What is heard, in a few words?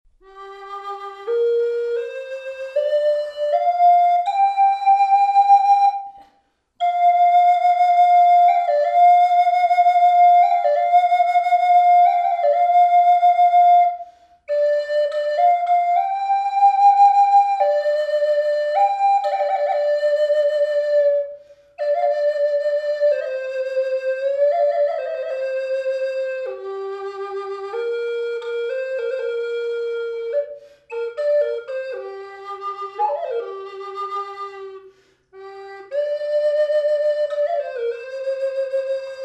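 reverb, flute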